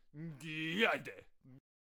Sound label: Attack Vocals